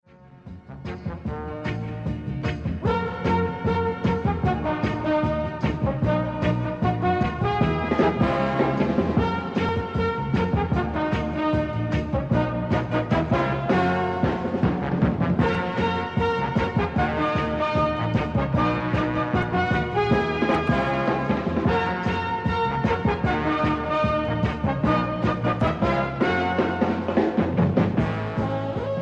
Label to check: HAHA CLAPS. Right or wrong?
Wrong